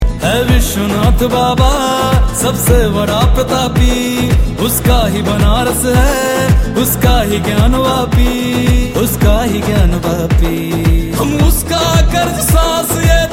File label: song